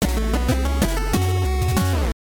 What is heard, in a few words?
Stage Clear, NES; Lethal Weapon